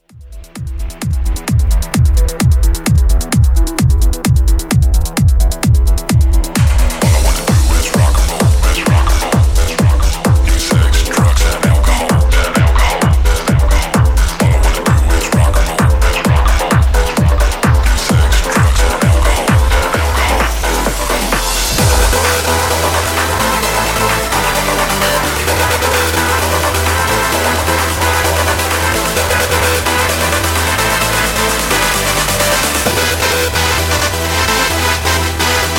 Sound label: tech house, 130 bpm; Epic Techouse Edit